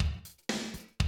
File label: 120, Classic Drum Loops